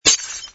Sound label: Shatter; yet another pixel dungeon android